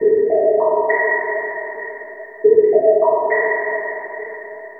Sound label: FX, Futurist Drum & Bass